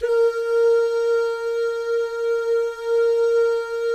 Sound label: DOO, jazz FX, mix guy gal; Spectrasonics; Vocal Planet